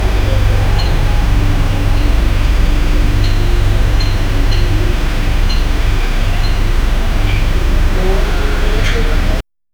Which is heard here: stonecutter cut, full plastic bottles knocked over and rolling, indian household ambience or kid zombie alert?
indian household ambience